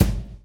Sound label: GRV, Groove; AMG Gota Yashiki Groove Activator